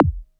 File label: MKS80 BD; DANCE KIT